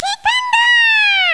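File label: thunder; Raiden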